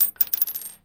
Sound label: Gun Shell Drop; soundscrate